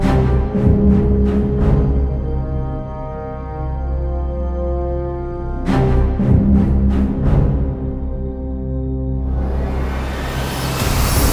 Defeat; mechs mercs black talons linux macos windows gamerip 2015

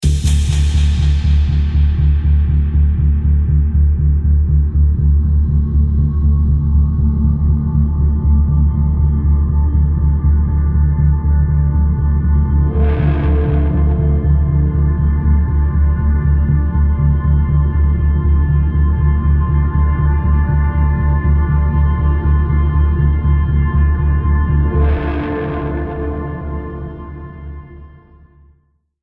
Production Music; Exponential; Invicta Beam

